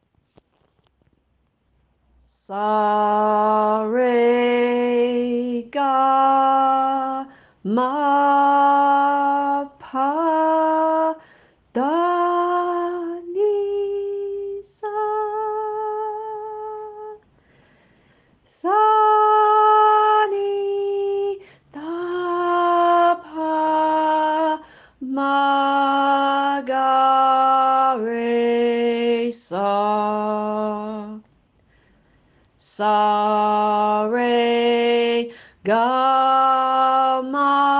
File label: INDIAN MUSIC SCALES; Sanskrit; mantra yoga